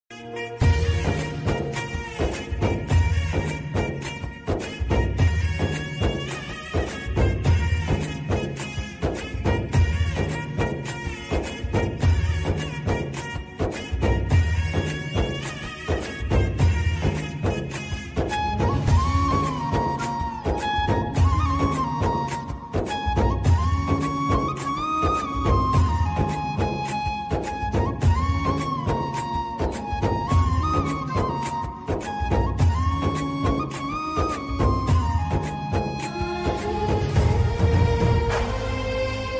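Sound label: Kannada ringtone, movie background music ringtone; yajamana movie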